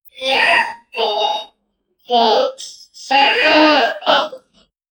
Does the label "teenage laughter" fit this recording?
Yes